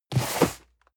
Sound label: cardboard box medium slide hard; objekte